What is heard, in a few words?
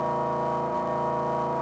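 hangar crane loop; machines mechanical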